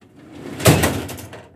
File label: Drawer closing hard, Foley; SFX; 7rystan's 600+ FREE EDM SAMPLES & DRUMS